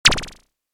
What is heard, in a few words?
Space fx pickup, Spaceship Environment Style Pickup, Short; Video Games; Stuart Duffield